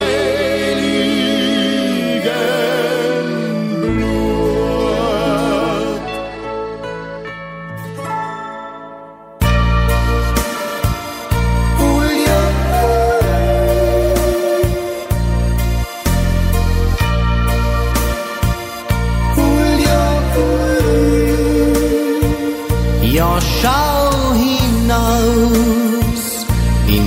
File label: good austrian